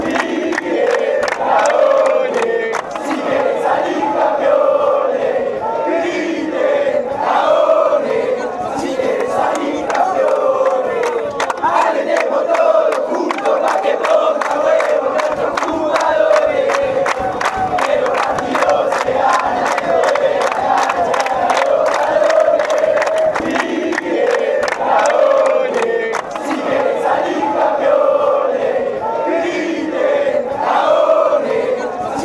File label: scream pussies, fanchants